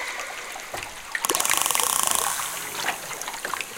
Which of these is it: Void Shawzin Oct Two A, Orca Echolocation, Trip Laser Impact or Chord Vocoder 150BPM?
Orca Echolocation